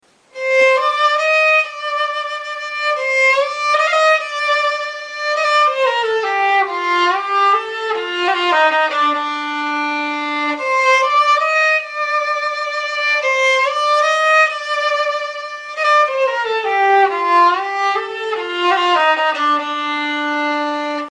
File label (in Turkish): keman